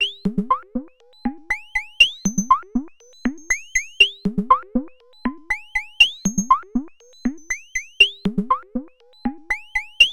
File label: Dreadbox Drips V2